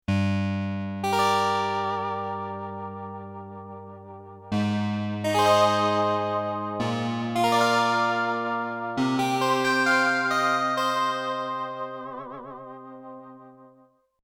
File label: UDO Super 6 Digital 12 string